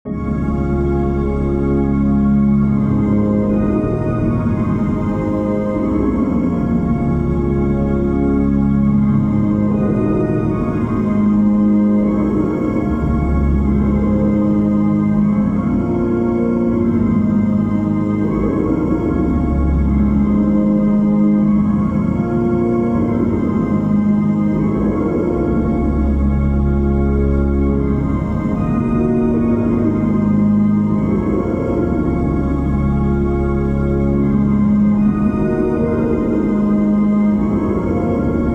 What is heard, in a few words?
suite for electric organ and electronic choir; third movement revelation